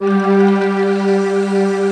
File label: PAD, HOUSE